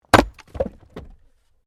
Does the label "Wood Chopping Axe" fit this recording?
Yes